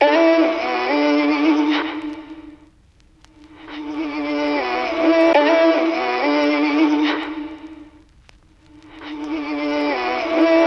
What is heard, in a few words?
vocal chop, 90, Em; Hills; Slate Digital Omnivox Vocal Sample Pack; Chops